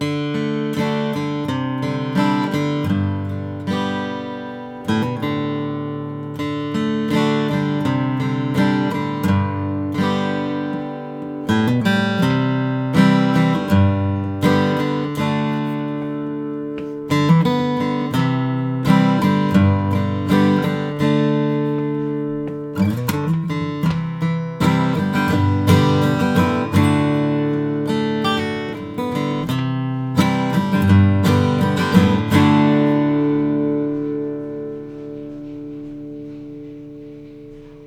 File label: froggy bottom sj deluxe mahogany